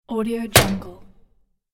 iron door close

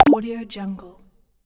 water drop click

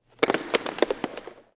hockey face off

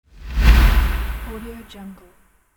fast whoosh

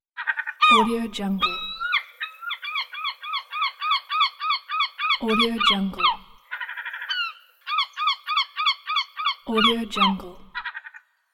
seagull; wavbrulee

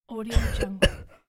boy coughing

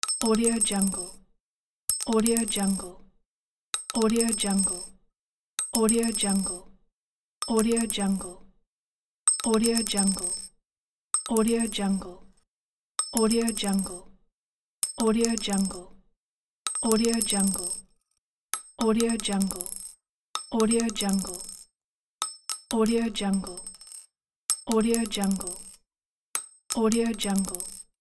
falling bullet casings; kaelv

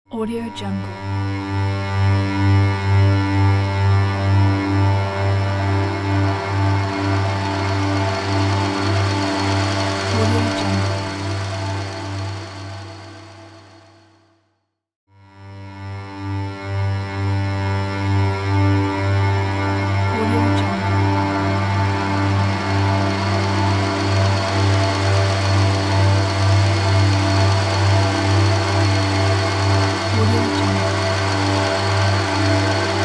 ominous drone

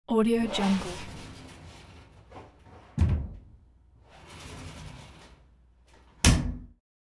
shower door open and close; hollywoodedge

